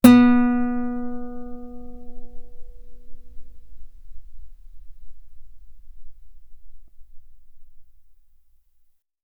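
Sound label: Guitar ff B string B3; sul B; OmniPower4296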